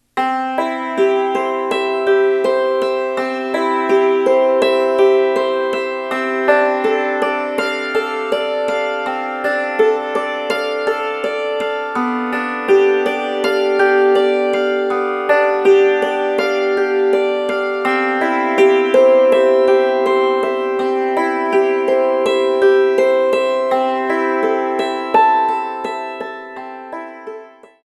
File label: prelude in c